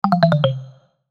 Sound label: Chime Musical, Chime Musical Multimedia